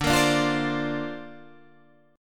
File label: D-Minor 7th Sharp 5th D Guitar Standard, chord; Dm7#5; strummed